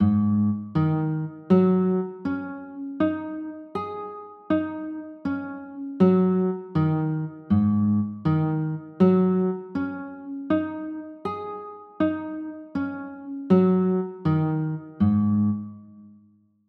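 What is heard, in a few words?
G sharp 7sus4 chord, guitar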